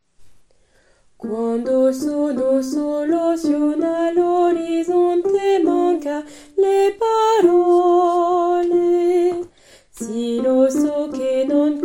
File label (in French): Con te, basse couplet